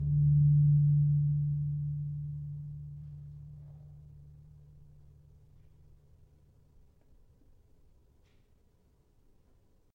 Gong 3 D sharp, gravity harps tuned

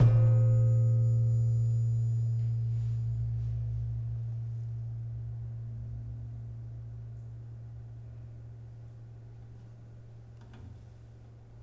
Slentem hard, gamelan

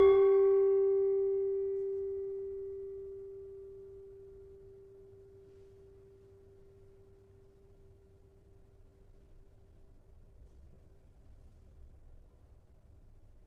Demu 4 G, gamelan tuned; gravityharps

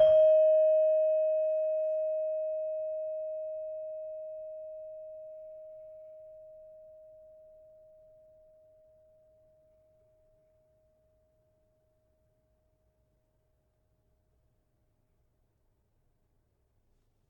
Saron 5 D Sharp, gamelan tuned

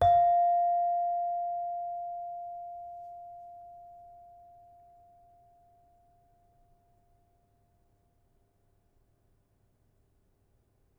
Saron hard, gamelan

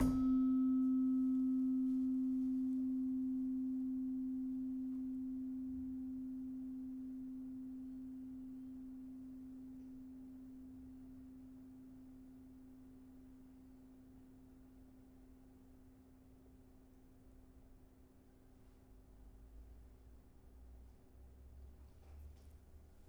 Slentem, gamelan

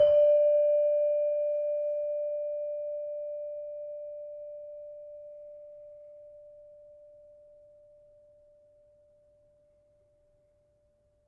Saron 5D, gamelan tuned

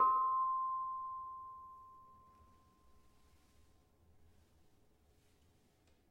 Barung 6 C, gravity harps tuned

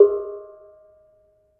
Bonang Barung, gamelan; gravityharps; timbrebox

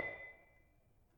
Panerus 6B, gamelan tuned; gravityharps

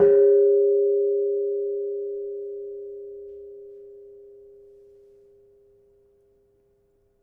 Kenong, gamelan

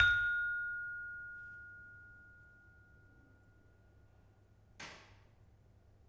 Peking, gamelan; gravityharps; timbrebox

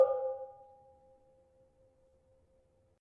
Barung 5C, gamelan tuned

